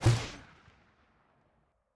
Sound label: mortar shoot, weapons; dod 1.3; dod enhancement pack